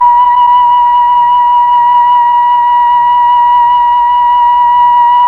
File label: FLT, Melotron Flt, Tron Flutes